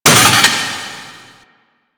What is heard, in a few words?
Vaults Stake Gate; Warframe; Entrati